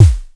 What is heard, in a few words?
Star Instruments Synare PS-1